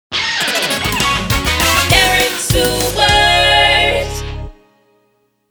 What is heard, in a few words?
epic, sung jingle with music